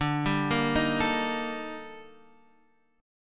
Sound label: Eb Major Sharp 11th D